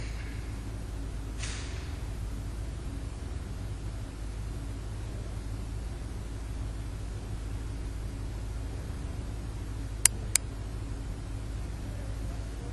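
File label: Church Pulpit two clicks; Community Methodist Church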